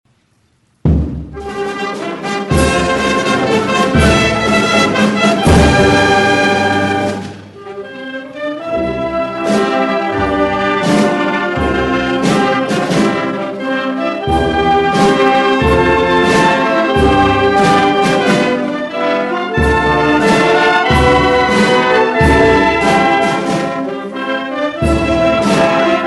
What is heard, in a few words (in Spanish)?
Himno a Chinchilla Instrumental